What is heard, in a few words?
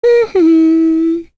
Hum, Vox; en; Lewsia A; kingsraid